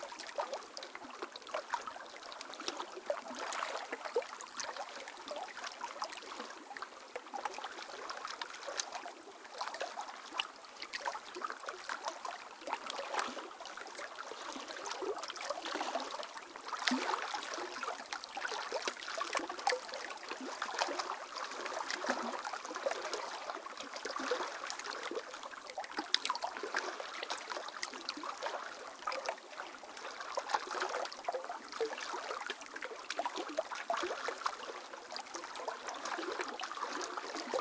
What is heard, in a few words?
creek; Golden Crayon Sounds